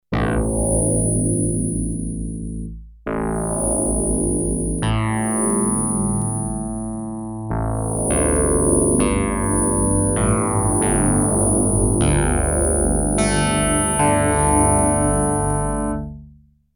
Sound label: grain, yamaha dx 5